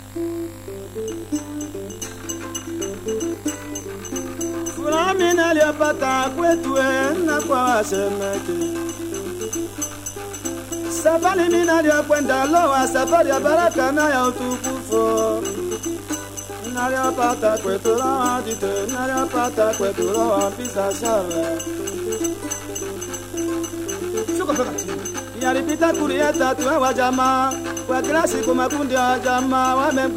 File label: congo guitars